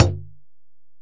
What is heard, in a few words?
Ac Perc, Drum Machines Alesis DM-PRO